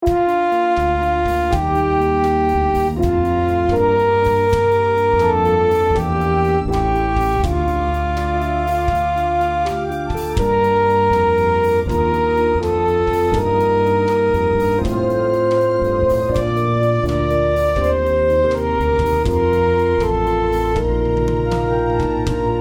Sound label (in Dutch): Psalm 121 inst